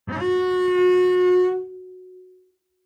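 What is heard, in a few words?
Bass arco fortissimo sul G Gb4, Double Bass; MIS Pitches - 2014; Strings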